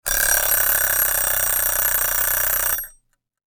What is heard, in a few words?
alarm; bedroom atom echo; ESPHome